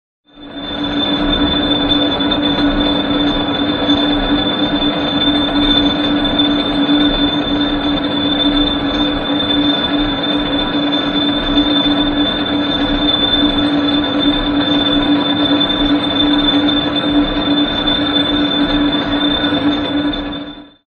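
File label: sounds of lathe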